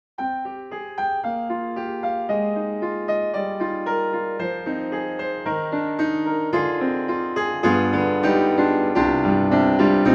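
Ravenscroft 275 All Mic Close and Player Half Side and Room Full, piano; VI Labs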